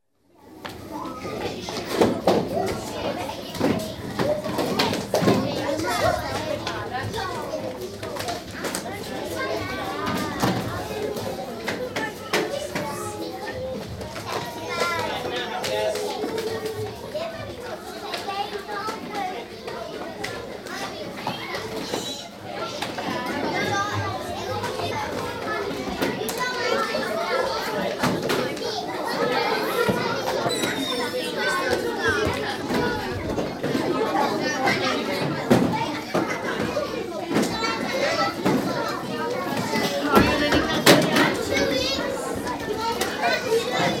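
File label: General Atmosphere cloak room 10 year old; The BBC Sound Effects Library; Schools & Crowds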